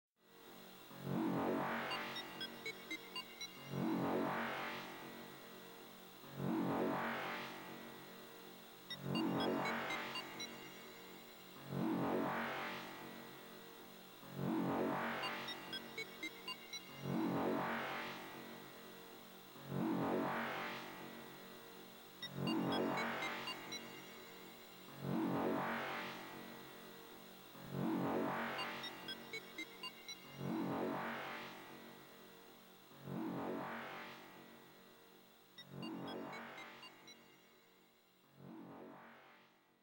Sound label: Elevator Room; Metroid Prime 2